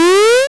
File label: trampoline